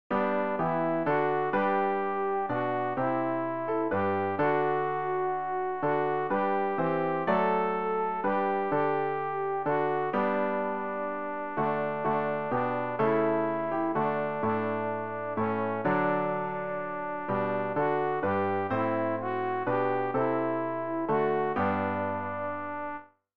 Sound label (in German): alt, Übehilfen für das Erlernen von Liedern; ref gesangbuch; rg 184 gott der du alles leben schufst